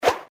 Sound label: Baseball pitch; GD900 Crystal; Game Sound